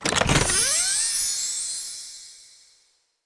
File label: Chest Coins Opening